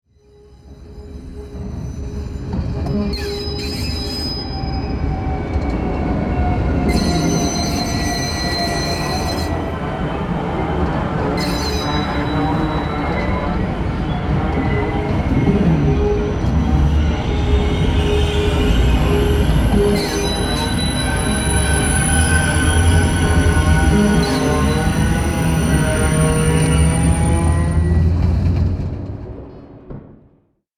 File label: Train brake screech and slow down; vehicle